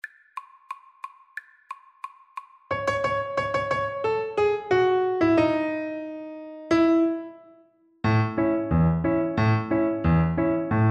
Double Bass

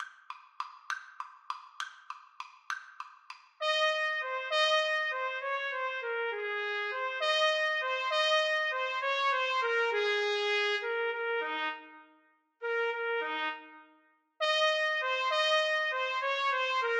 trumpet duet

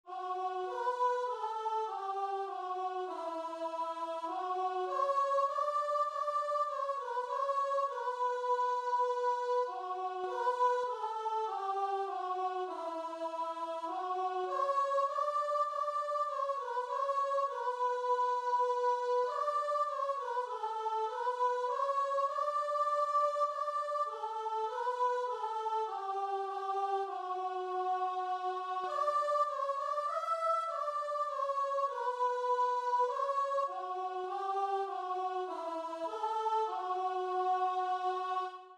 o sacred head, guitar and vocal

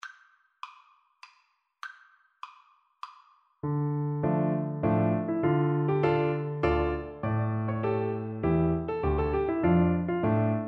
Clarinet

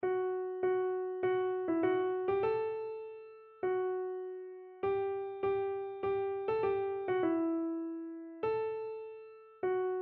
shall we gather, keyboard

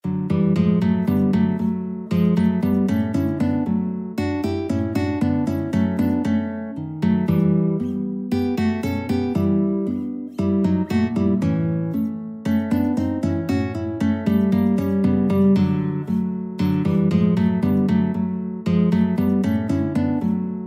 4 guitar, guitar ensemble

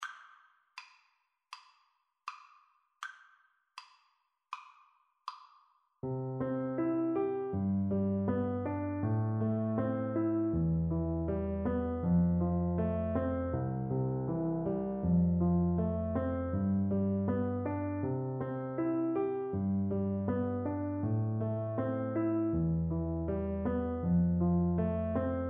canon; Percussion

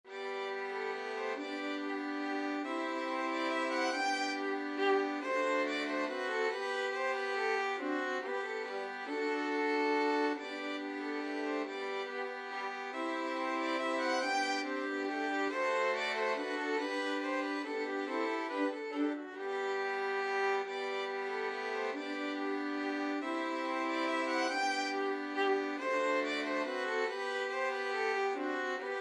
minuet, violin quartet; bach

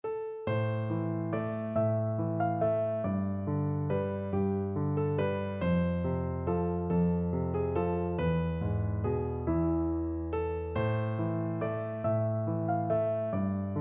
greensleeves, piano duet

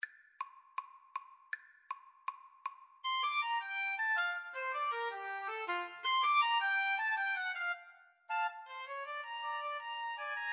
woodwind trio

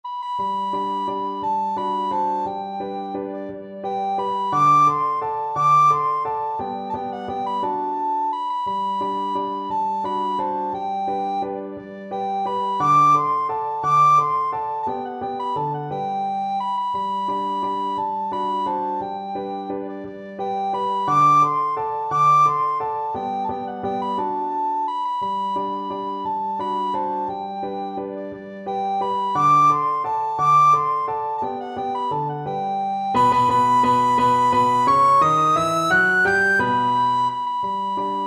german dance, recorder; schubert

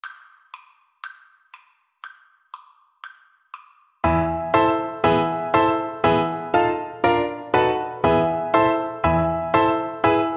tarantelle violin; gottschalk

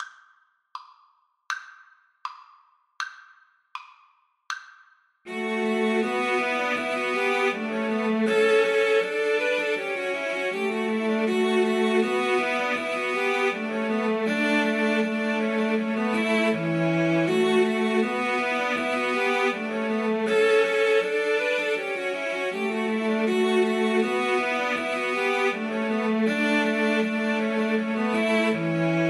Bach Gavotte Cello Suite 6

